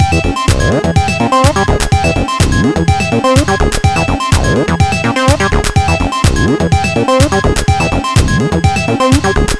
Acid New Beat; 100 acid vol 1

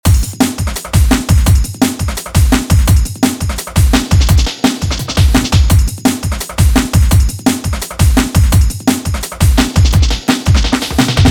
DnB; drum; beat; Drum Blaster: The Ultimate Beat Generator, Sampler Plugin for Modern Producers

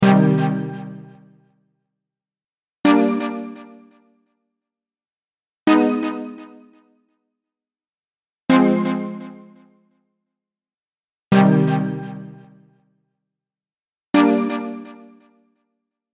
City Stab

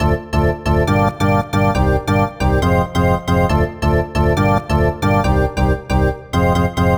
Deep Organ Stab; Rave Generator 3; 90’s Rave & Techno VST/AU/AAX/CLAP Plugin

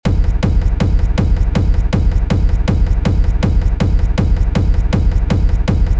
hard techno kick; authoritative knucle; hard techno kick generator

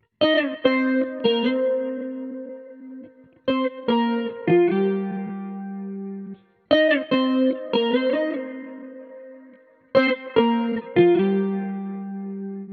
Fuzzy String Dry; distoblast

